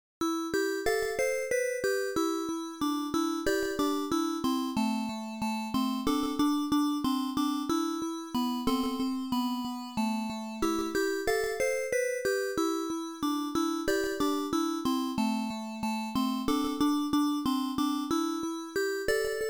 Music Box; Shizuku; PC-98 gamerip

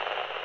Radio Noise; hidden deep windows gamerip 2022

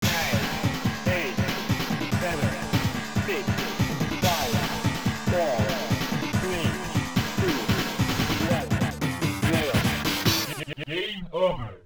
Continue ~ Game Over (with voice); Lethal Crash Race; arcade

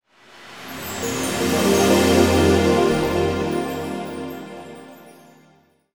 Jingle Rebirth

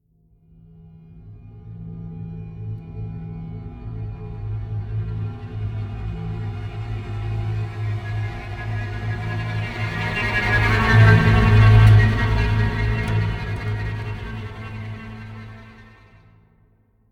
Stingers; outlast 2; ps4; windows; xbox one

